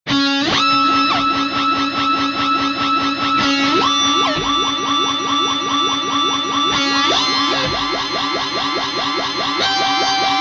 Electro Harmonix Cathedral Infinite Delay 8 String Vox Satchurator EHX Ring Thing; Roland JC120s; Jaden Rose